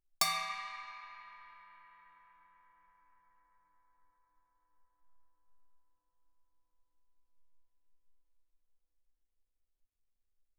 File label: chinese stick bell ff, suspended cymbals; Percussion